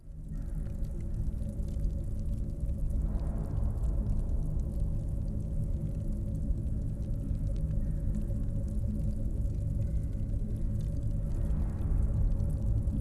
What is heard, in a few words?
Fire Caves Ambience